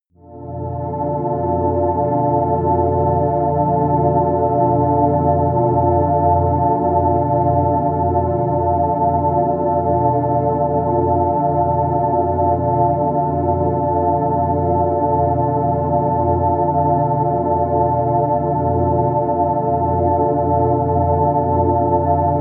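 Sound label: mysterious energy, pads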